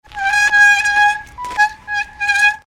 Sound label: Pushbike Brake Squeak; Bicycles; Vehicles; Tom Hutchings